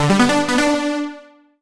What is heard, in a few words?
MUSIC EFFECT Solo Warm Synth Positive; startide macos switch windows gamerip 2017